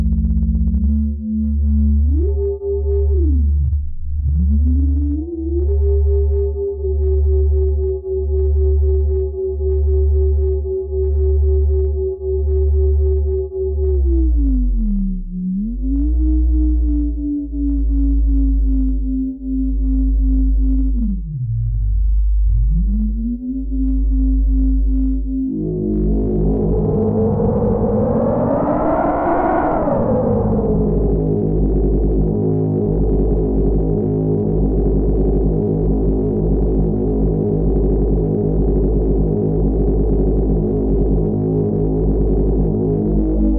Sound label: spectraphon chaos; Make Noise